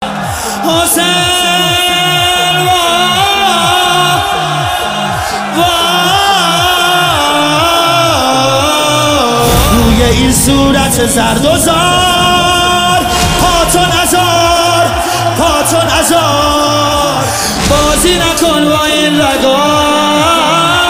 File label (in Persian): مداحی